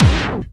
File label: Hits & Explode